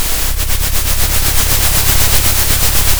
pop, Sound of a train

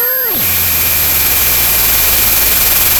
pop, Sound of a cat meowing

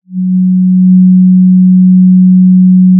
pop, Sound of a cruise ship horn

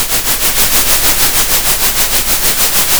center, Sound of a train

